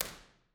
Phipps Hall Huddersfield, Convolution Reverb, Real Places; Ableton